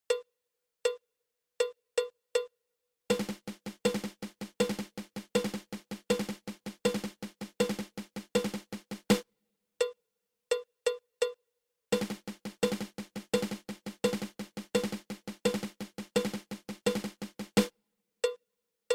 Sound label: Single Dragadiddle